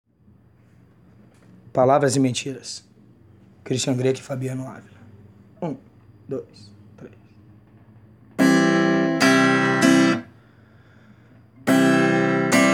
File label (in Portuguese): batidão